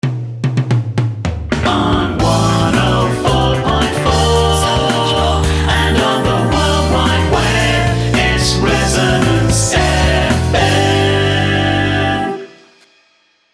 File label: jingle